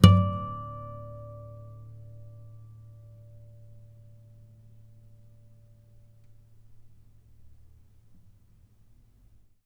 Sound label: harmonic, strings harmonics